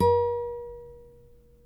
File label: NYL NV C, nylon no vib guitars; Spectrasonics; Hans Zimmer Guitars VOL-1